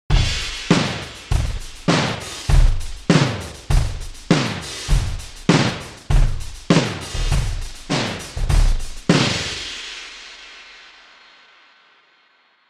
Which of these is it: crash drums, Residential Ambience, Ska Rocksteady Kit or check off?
crash drums